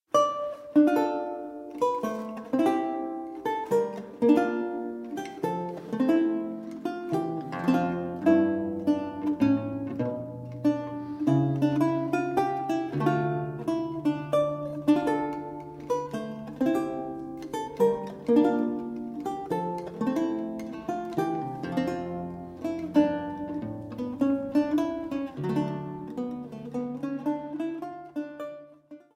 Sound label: Chaconne